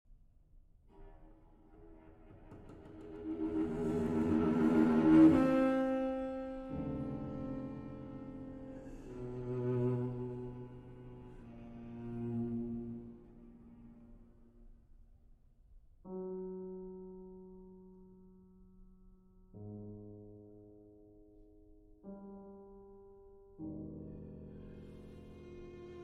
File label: Little Pieces for Cello and Piano, Live; Op. 11 3. Äußerst ruhig; Schloss Elmau, Krün